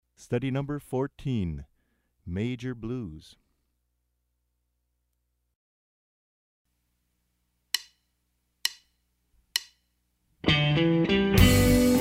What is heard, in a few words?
Major Blues